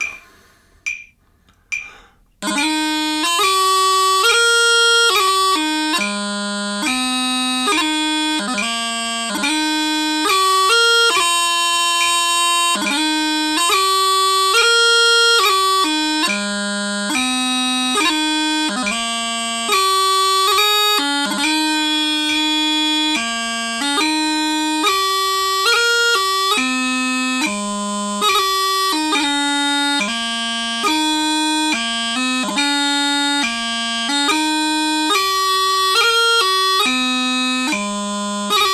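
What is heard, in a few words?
seconds 70bpm, Chanter Recording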